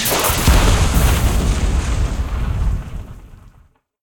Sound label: monsoon Big Flash; jumanji